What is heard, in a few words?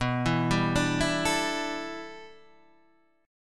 A 7th Suspended 2nd Suspended 4th